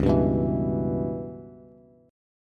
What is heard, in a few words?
Db Minor 7th Flat 5th Db down Bass; strummed